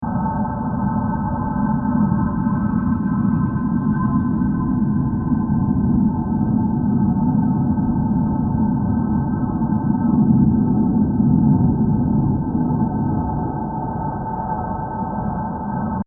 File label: Mysterious Space Ambience 120bpm; Sound Design